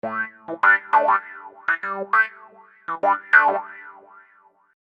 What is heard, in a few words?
Wah Phunk Rhodes; Construction Kit; HIP-HOP; Sonic Foundry (Sony Creative Software)